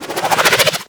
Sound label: SMG Alt Projectile Deploy; Warframe; Tenno; Sundial SMG; Weapons